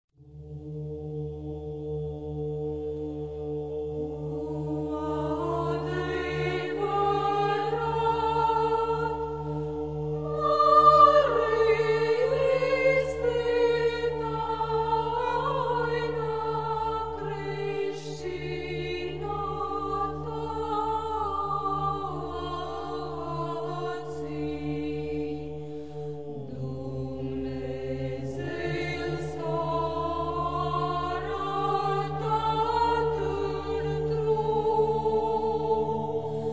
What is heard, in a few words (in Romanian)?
Taina Crestinatatii glas 5